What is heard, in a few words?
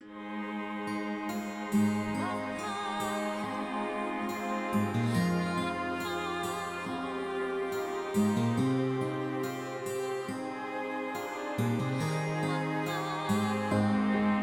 A Journey Within G maj 70 Bpm